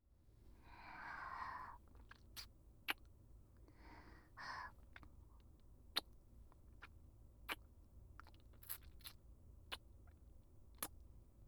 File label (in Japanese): キス; SEあり